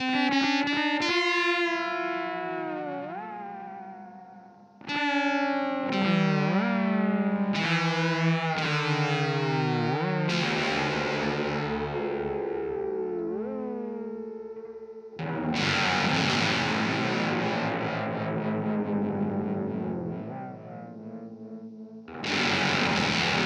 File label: Teisco